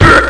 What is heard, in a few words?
Small Impact; Carma